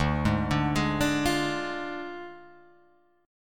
D Diminished Guitar Open G, chord

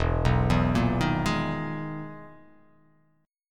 E-Major Sharp 11th E

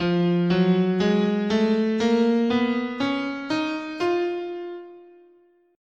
F Eight tone Spanish, scale

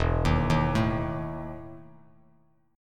E Major 7th Suspended 4th Bass Standard; EM7sus4 arpeggiated

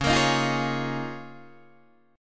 Db 6th Add 9th Db, down, Guitar Open D

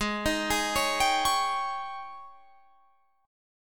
G sharp Major 7th Suspended 4th, Guitar, Drop D; 18,18,18,18,20,21

